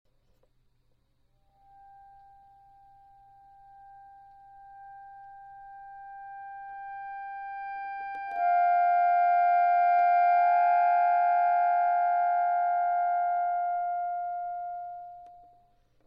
reed response real

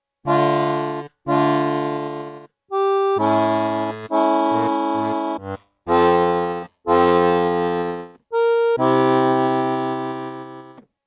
c just minor

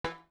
release sample

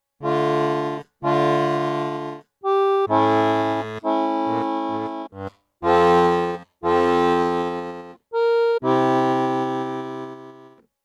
c just major

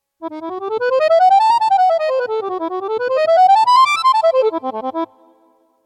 run sampler

